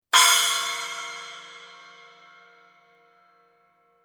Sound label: splash cymbal; HH; Sabian